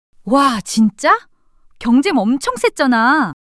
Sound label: surprise